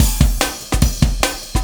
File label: Warp Drive Beat, Processed Drumkits